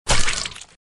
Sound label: Sfx Enemy Pig Dead; Mighty Knight 2